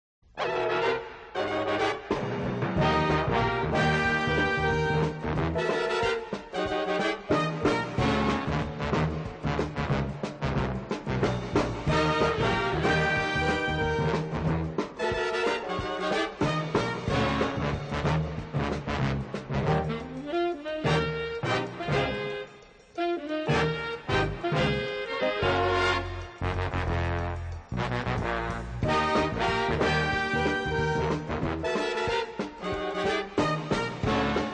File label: orchestra; Ajax